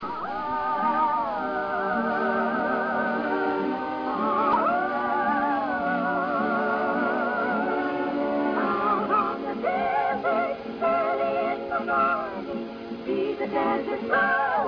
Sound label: agogo